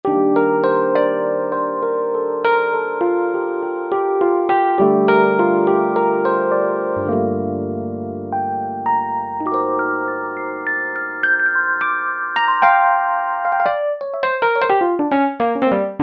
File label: Ep Tine; Piano - Keyboards